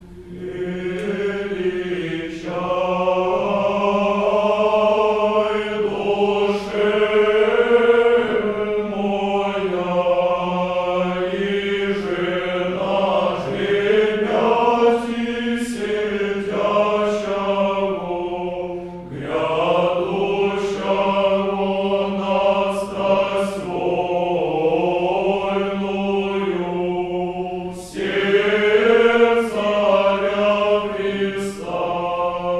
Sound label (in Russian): Концерт в Благовещенском Соборе. Пасха. задостойник; Казань; Входу Господню во Иеросалим